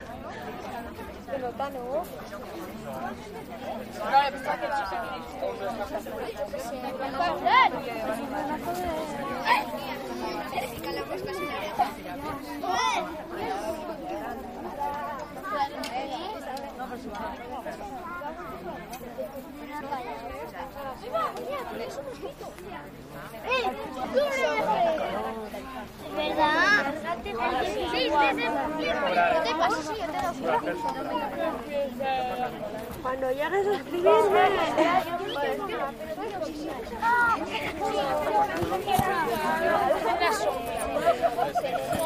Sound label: Spanish Crowd A